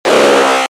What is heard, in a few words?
energizing event